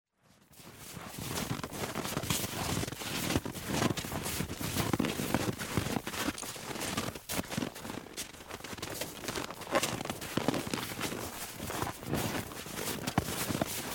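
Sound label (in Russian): лошадь ходит по снегу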